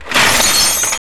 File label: TOOL BOX, METAL & WOOD; Roland - LA Composer vol. 1